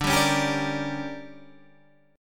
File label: D Major 7th Suspended 4th Sharp 5th Guitar Standard, chord; strummed